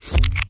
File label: New level